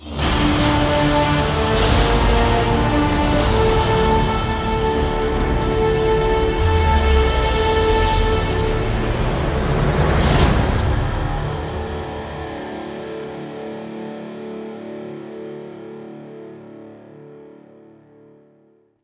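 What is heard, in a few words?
Sting Dropship Lift Off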